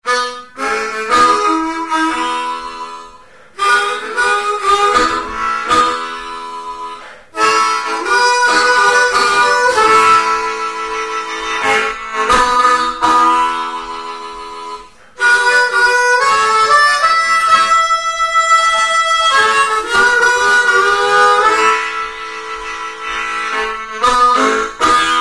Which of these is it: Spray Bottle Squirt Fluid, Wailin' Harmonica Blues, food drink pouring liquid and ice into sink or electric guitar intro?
Wailin' Harmonica Blues